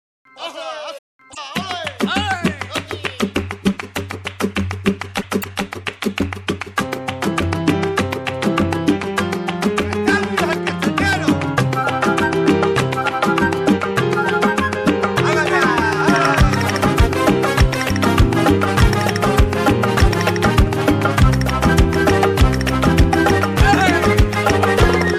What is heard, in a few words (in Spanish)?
Danza Mora Inst